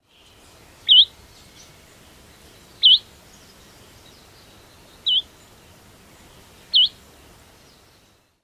sweet calls; KY

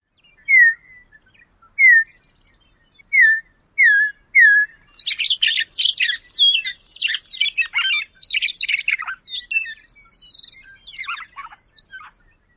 introductory whistles & flight song

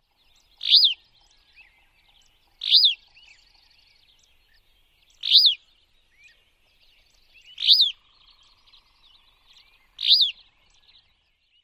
rrreea calls

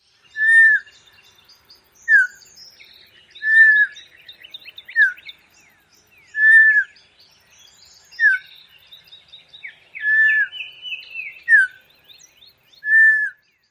whistled calls

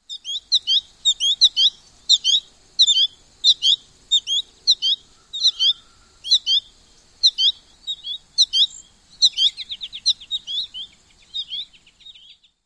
chip-pee fledgling call

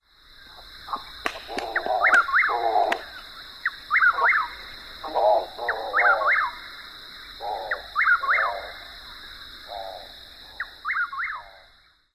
wing claps and croaks

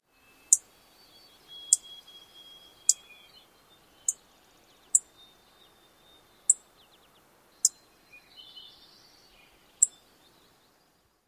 calls

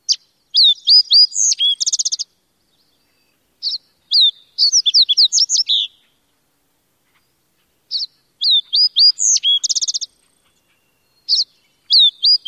songs red subspecies

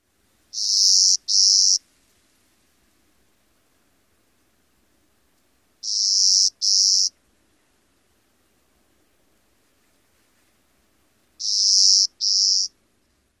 2-buzz song

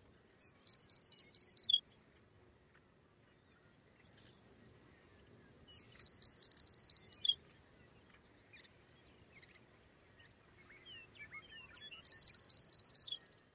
songs

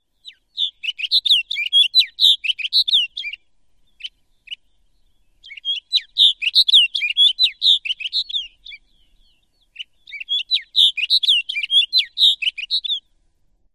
songs

